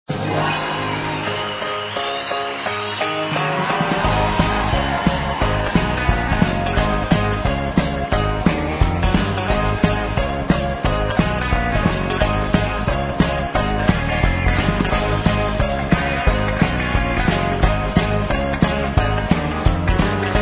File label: groove